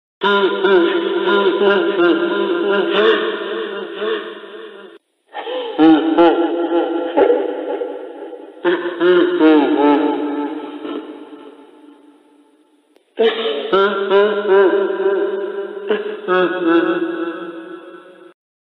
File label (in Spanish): Risa; Freddy fazbear